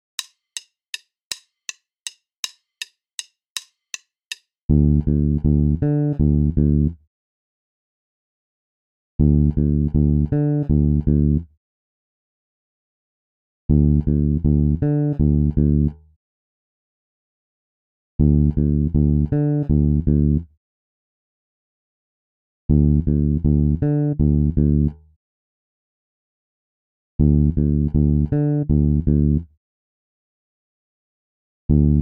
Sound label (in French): decalage triolets exercice basse tempo 080